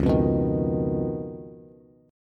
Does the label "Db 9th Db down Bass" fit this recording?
Yes